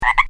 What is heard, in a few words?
pregilla yakima, frogs